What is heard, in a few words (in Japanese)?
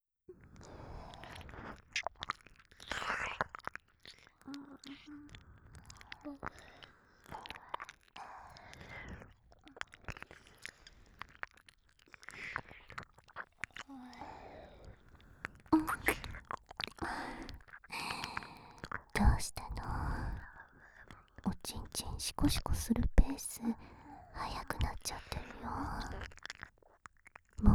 射精誘導 ひたすら耳舐めで精通サポート